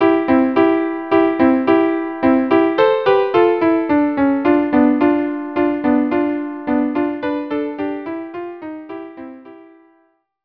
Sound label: violin duo; Hanukkah Hanukkah